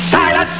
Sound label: Silence